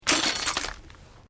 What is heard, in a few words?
Glass movement minor; Materials